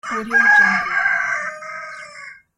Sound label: rooster